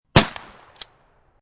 12 Gauge Barrel; 870; War; VRSonic

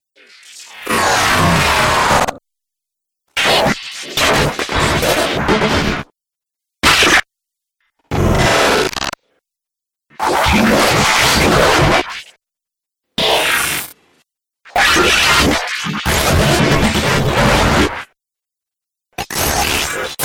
Dubstep Scifi Effects

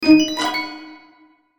Logo Plucked Strings Twinkle; Sonic Logo; Blastwave

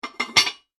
household slow cooker lid on; Ceramic Slow Cooker Lid Placed On Cooker; Kitchen

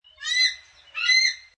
American Bald Eagle Squawks 2 Times, Bird of prey; Eagle Sound Effects; Lynne Music

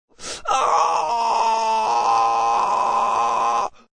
Man In Pain, A man in pain

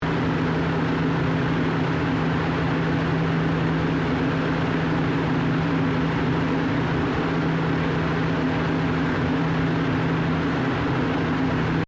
MACHINERY AIRCONDITIONER VENT ACTIVE; Industrial machines

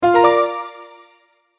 multimedia tone start

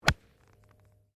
GOLF SWING IRON; Various Golf sounds; Sports

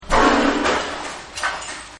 Metal Clang; Materials

